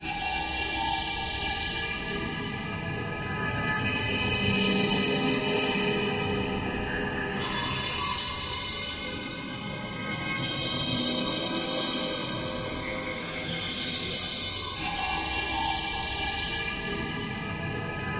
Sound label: Glowing Drone Abstract